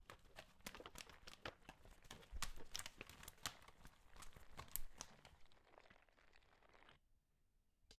Fish On Land

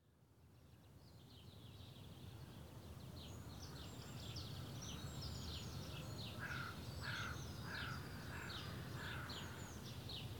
Early Morning Village; Alem; 7am

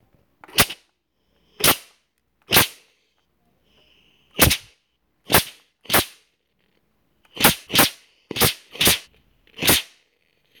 Ironer Steam

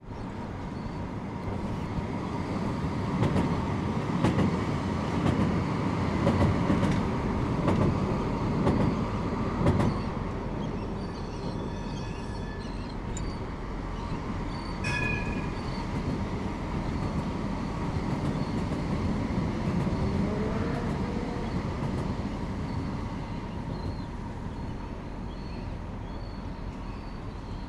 Tram On Douro Bridge

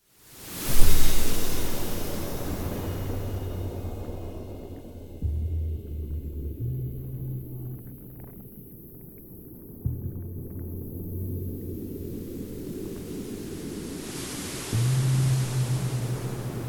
Water

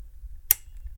Lamp Switch

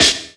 Impact Snare

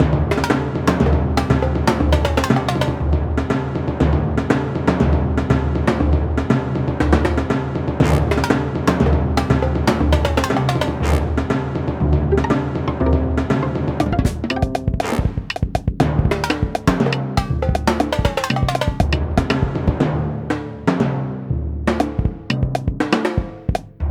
Timpani loop Long

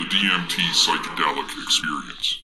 Psy Trance Vocals

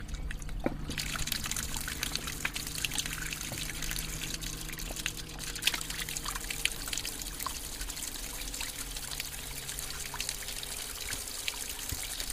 Pond Overflow

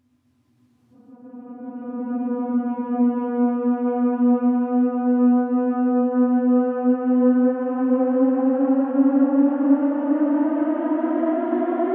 Stretched Theremin

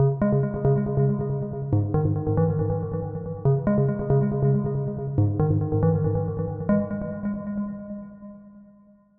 Moog Like Bass Melody

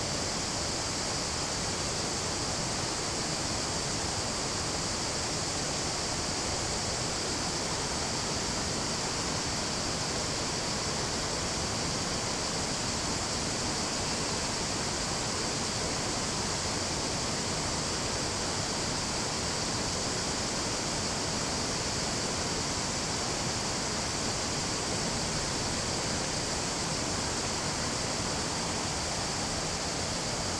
Krka Waterfall

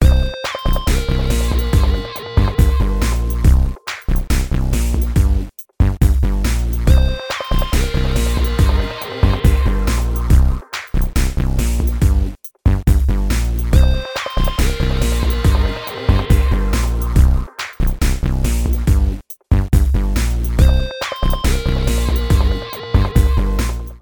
Short Dubstep

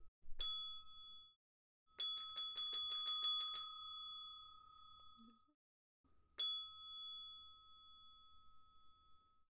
Bell Ringing

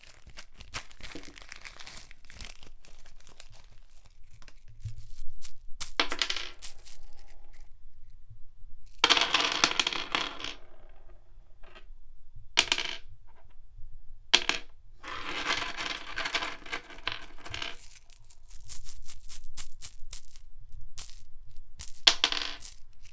Screws

